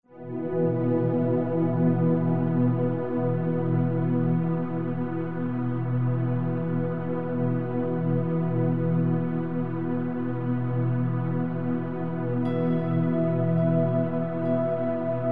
Amb Sound Scape Wind, Chords, Japanese Koto echoing; Ethnic; Ambient SoundScapes